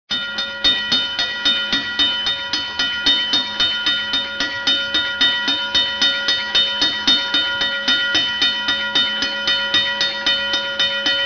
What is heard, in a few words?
Train warning bell